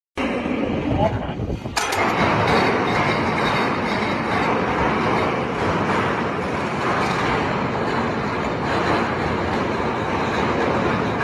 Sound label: ship brake